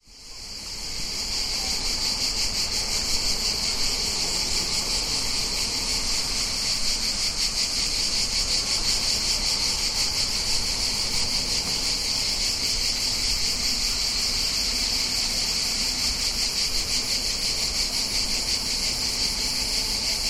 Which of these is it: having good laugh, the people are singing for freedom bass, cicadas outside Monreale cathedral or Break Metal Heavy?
cicadas outside Monreale cathedral